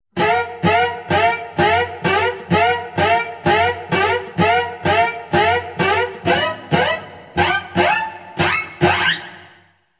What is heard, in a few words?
Cello Danger